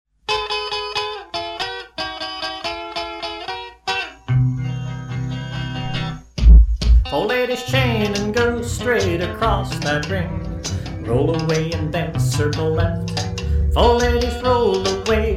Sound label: vocal mainstream, square dance